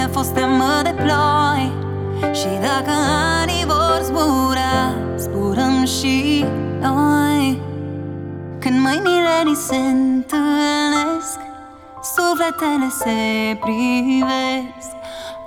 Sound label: Piano Version